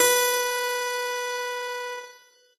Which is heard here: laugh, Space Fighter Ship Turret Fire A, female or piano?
piano